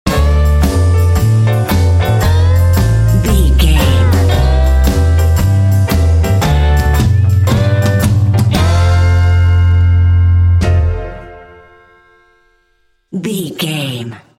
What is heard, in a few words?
lets slide bro sting